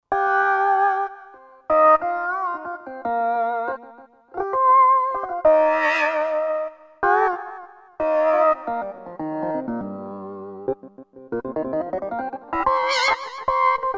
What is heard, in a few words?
Clavi Lead, Roland SR-JV80-03 Piano